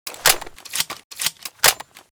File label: obrez reload; STALZONE